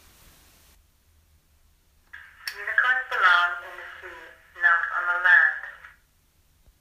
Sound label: Bathroom